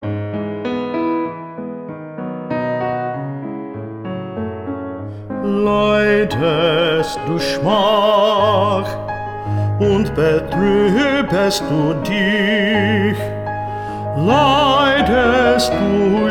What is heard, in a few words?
bass 1